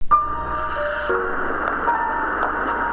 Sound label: movie piano